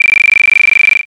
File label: trainbell